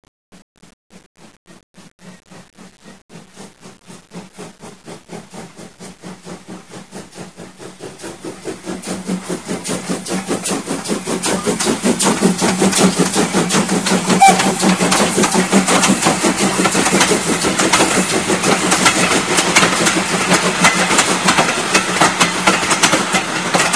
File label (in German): Lok Vorbeifahrt